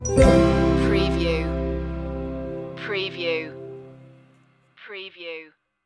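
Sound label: stinger, Acoustic Country / Folk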